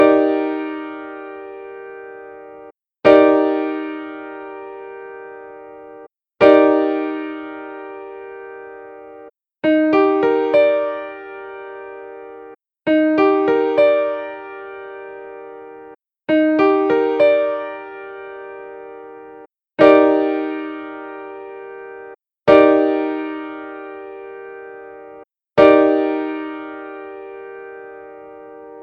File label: Ebmaj7 Piano Chord